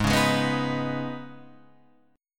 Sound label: Eb 7th Suspended 4th Sharp 5th Ab